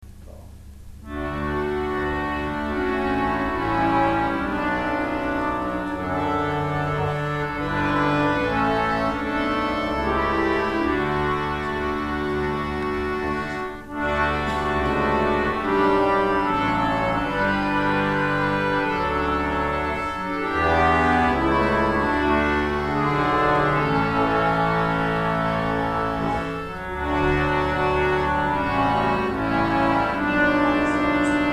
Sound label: abide with me band, concertina; kilve 2007 10; hymns